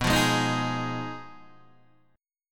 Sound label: B 6th Flat 5th B down Guitar DADGAD